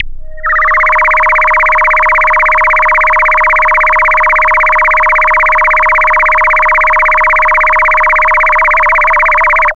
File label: FX SWEEP, SYNTHSWEEPS